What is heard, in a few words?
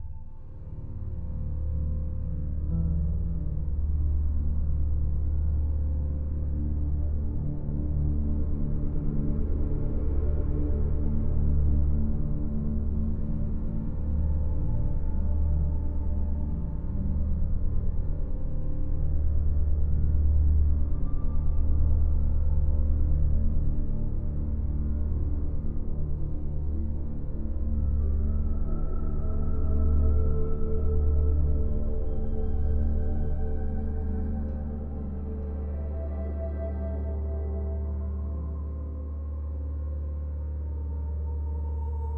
score Graveyard; Journey